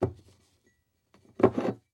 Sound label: Household Bowl Grab And Slide